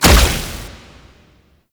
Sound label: Cast; Technorot; Warframe; Infested Lich